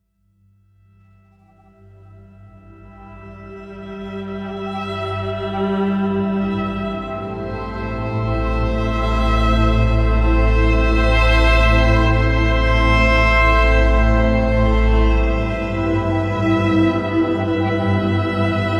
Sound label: Viola Textures; Catalyst